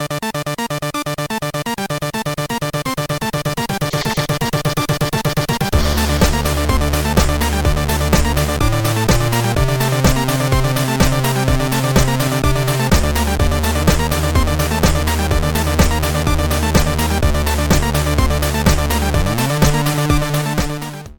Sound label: 8 bit